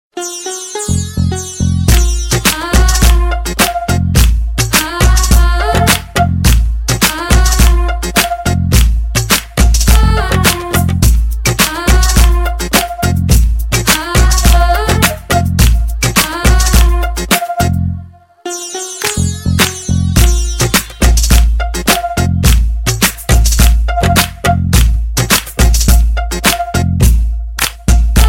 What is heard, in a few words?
Bollywood hip hop